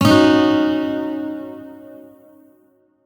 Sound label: Shawzin Yo Chord L; Music; Warframe; Lotus Shawzin